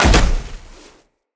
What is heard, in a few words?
land heavy snow, footsteps; Characters; Tenno